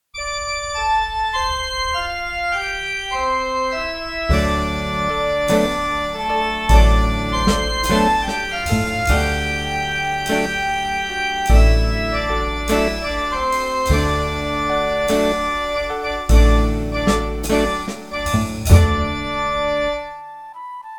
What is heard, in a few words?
D Dorian Flute Music